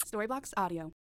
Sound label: kiss quick short smack kiss